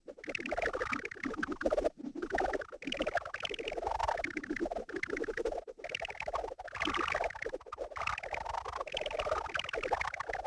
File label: Whipper Bug, Vocals - Humanoid